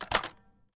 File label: mag rel, m82; weapons; Urban-Coalition; Anarchy; arccw ue